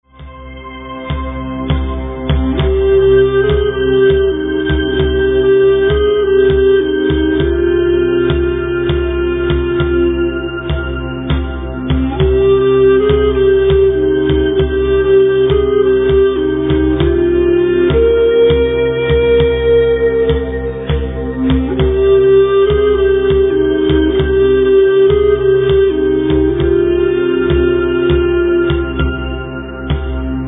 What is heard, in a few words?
flute sample